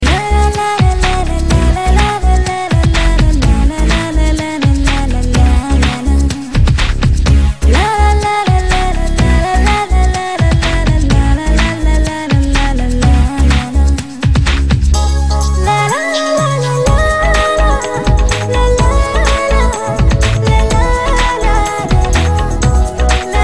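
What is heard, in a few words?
Background Tone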